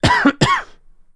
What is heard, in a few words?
Short Double Cough